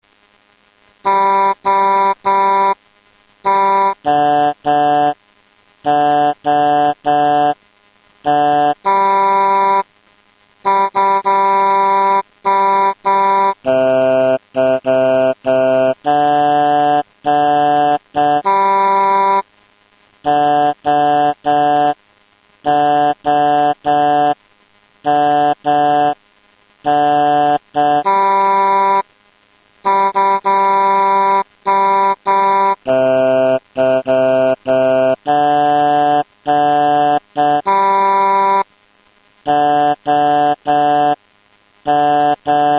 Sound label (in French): flute T&B; W.A. Mozart; La Flûte enchantée - "Das klinget so herrlich"